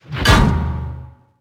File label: armor not pierce